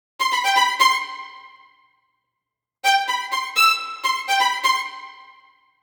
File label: Origi Funk, disco